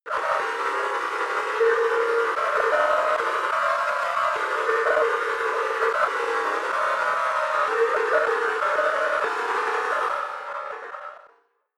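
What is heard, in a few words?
horror sonar, pads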